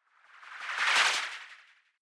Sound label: whoosh simple flutter futuristic high